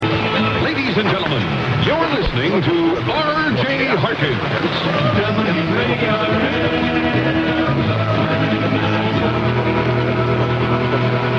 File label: radio; WARM 590 SCRANTON PA